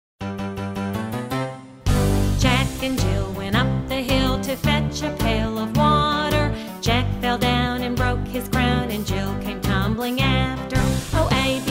Nursery Rhyme Rap

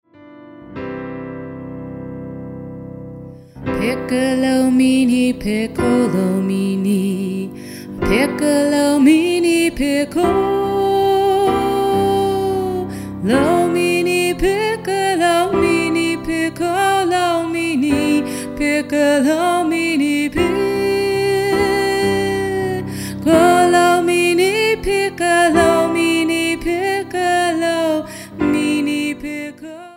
Piccolo

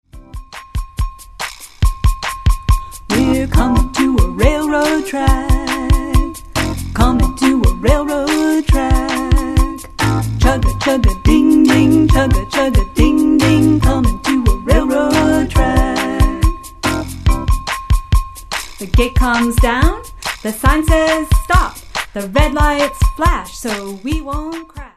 Chugga chugga ding ding

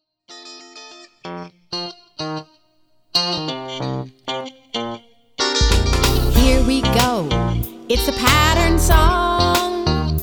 Pattern Song

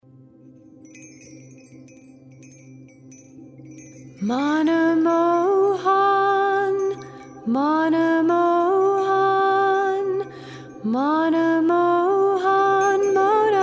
Meditation And Prayer Sacred Chant

